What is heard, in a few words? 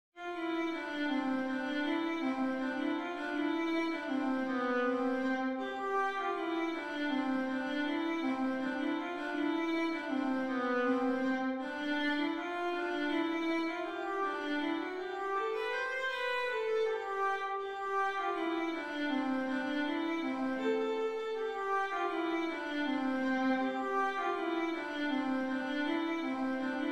deck the hall